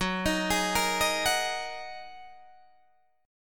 F sharp 6th Add 9th Guitar Open D, chord

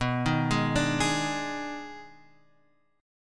Eb Major 7th Suspended 4th Sharp 5th B

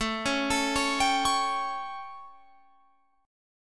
A 7th Guitar Open F, chord; arpeggiated

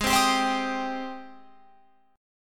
Ab Major 7th Ab down Guitar Open F; AbM7 strummed

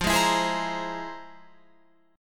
F Minor Major 11th, Guitar, Open F; strummed; JGuitar

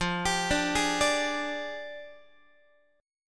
F Minor 6th Add 9th, Guitar; JGuitar

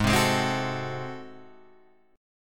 Ab 7th Flat 9th Guitar Open G, chord

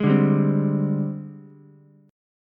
Ab Major 7th Flat 5th Ab, down, Ukulele; strummed; JGuitar

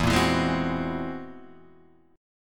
F sharp 9th Flat 5th E, chord

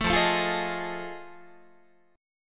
F 7th Sharp 9th Guitar, chord; F7#9 strummed

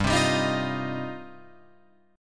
G 7th Suspended 4th Sharp 5th, Guitar Open F; Listen to G7sus4#5 strummed; G7sus4#5 Chord

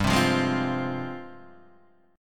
F Minor G, Fm/G chord; strummed; JGuitar

